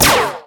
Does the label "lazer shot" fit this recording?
Yes